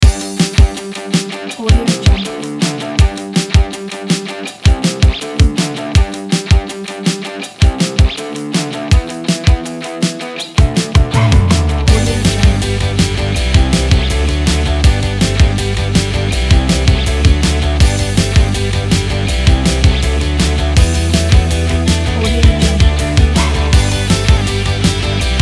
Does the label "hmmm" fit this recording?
No